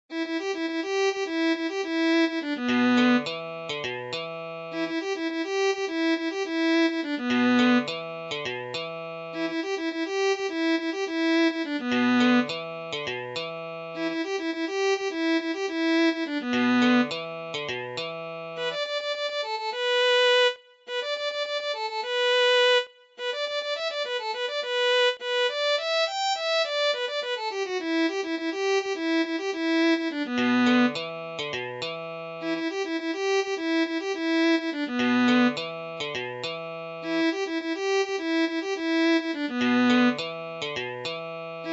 Violin

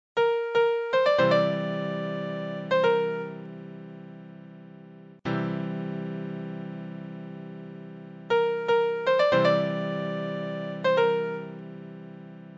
Piano

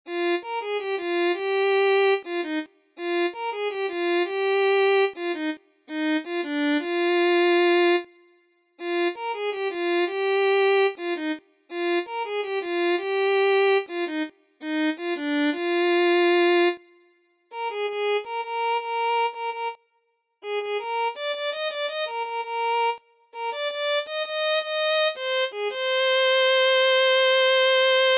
Violin

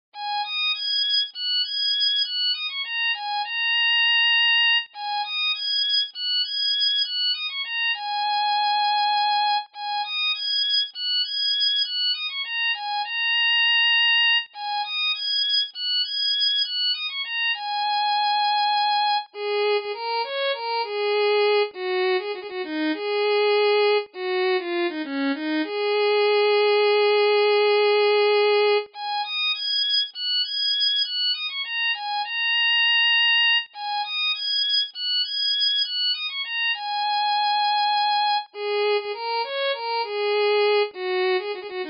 Violin

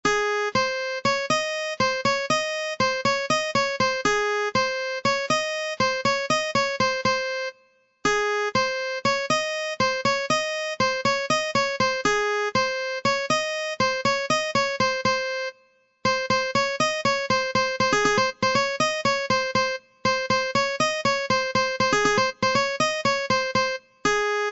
Guitar

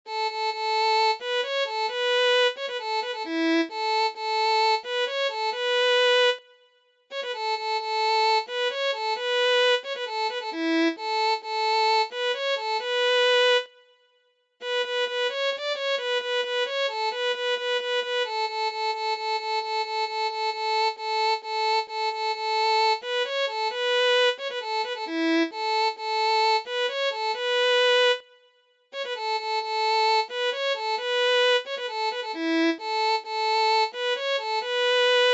Violin

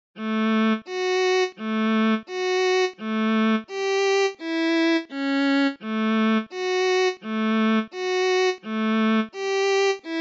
Violin

